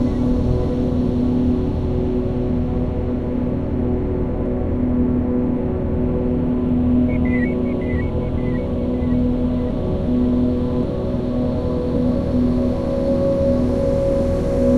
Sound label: Flight Ambience; Mirror's Edge